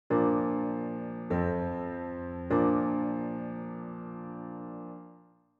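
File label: Bb major PAC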